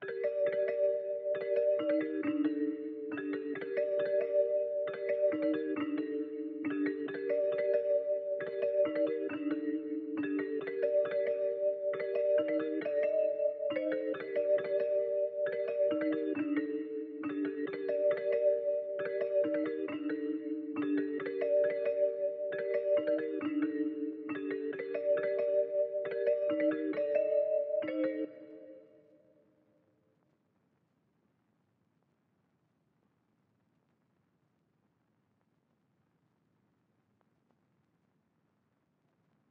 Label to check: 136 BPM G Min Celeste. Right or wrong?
Right